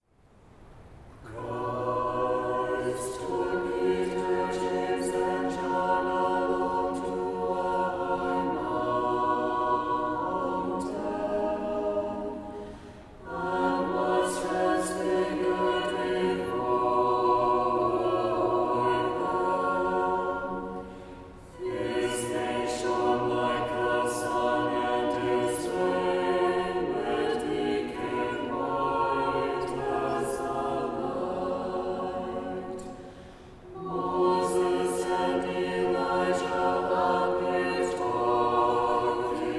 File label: Kievan Chant SATB; chanting tutorial